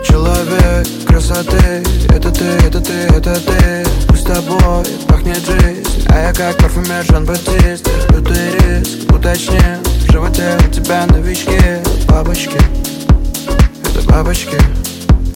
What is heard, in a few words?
grustnyj trombon